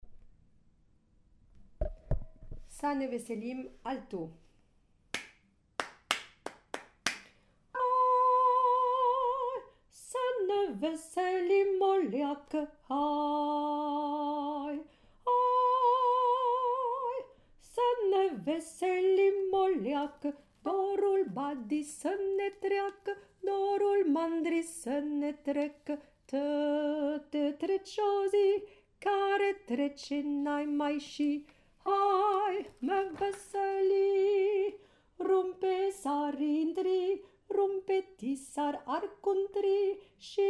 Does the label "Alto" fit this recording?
Yes